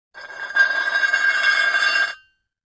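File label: Metal Scrape On Cement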